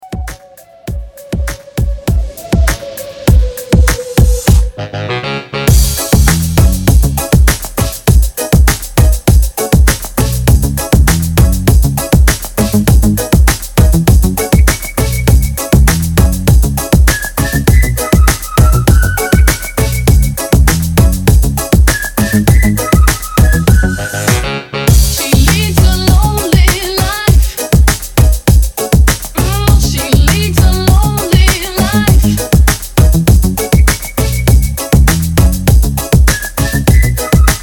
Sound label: Redrum 100Bpm